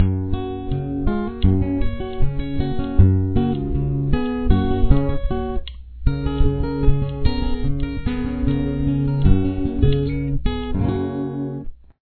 outro

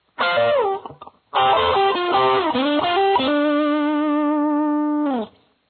solo

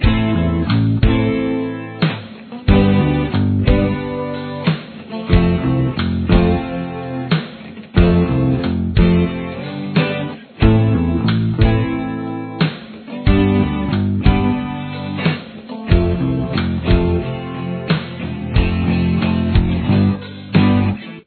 am backing, guitar

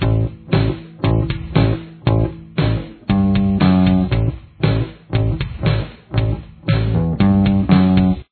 bass

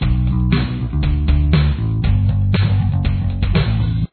chorus bass, just the bass guitar; drop D tuning

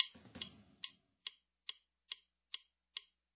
click; The guitar will be in standard tuning.

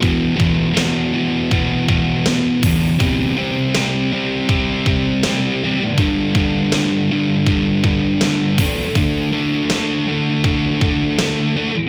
guitar two

